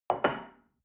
shell upside down place on table soft; berufe; gastronomie; soundpool

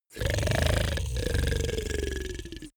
monster snoring

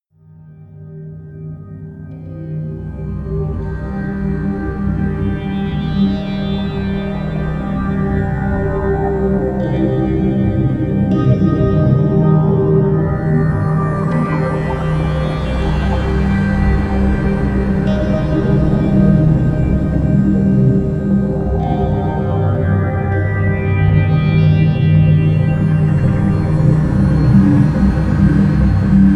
drone spanish

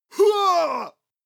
male attack shout; schreie; menschen

